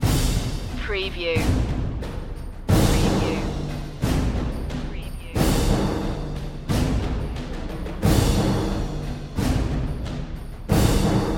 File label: perc mix